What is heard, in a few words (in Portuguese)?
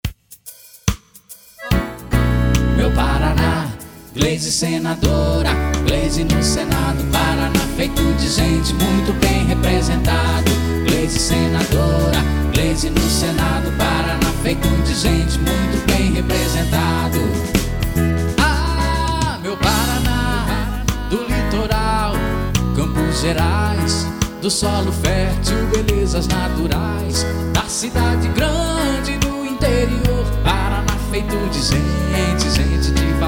jingle